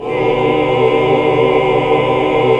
MIN, CHORDS; Sound & Vision - Gigapack II CD 1 (Roland); HAL Greg.Chords1

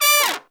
Pop & Funk Brass, BRASS; Best Service ProSamples vol.25; AKAI

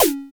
FX A, ANALOG; Sound & Vision - Gigapack II

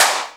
JD800 Dance Card Drums

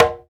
Congas, African Contemporary

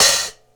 Vintage Bit Hit Hi-Hat; Drum n Bass; Hi-Hat One-Shots; KJ Sawka; Drum n Bass & Beyond; Drum Tool Box

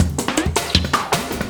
LOOP, 160 BPM, Drum & Bass

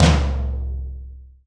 TOM TOM, Roland

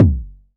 KIK, Pearl DRX-1

